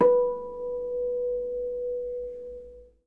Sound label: H B4, Percussion & Harp; Miroslav Vitous